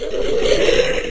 pain, monsters screamer; AoMDC

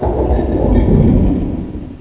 alert, lurker, monsters; AoMClassic

